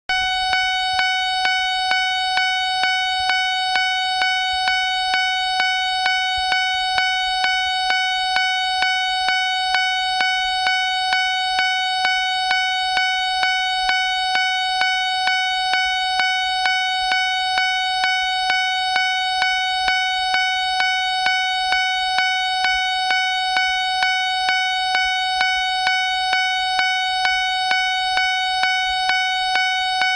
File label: fumikiri, railways